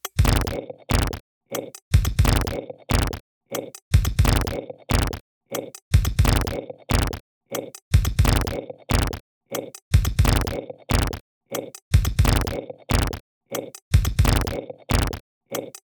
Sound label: Modular; sampling instrument; Rando by Monkeyc; Atlas